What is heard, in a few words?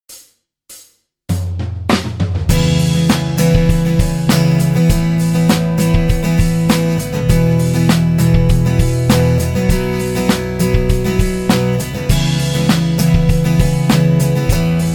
Rock Tracks